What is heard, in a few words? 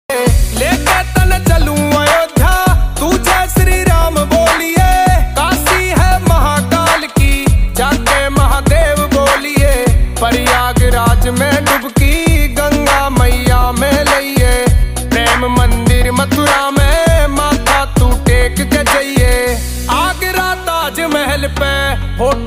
Ringtone